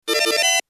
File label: insert coin; galaga